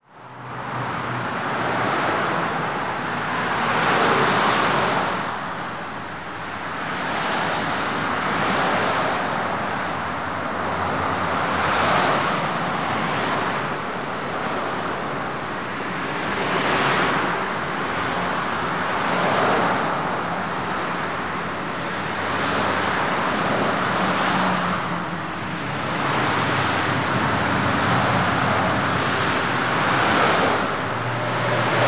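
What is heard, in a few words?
sounds of cars, traffic jams and car traffic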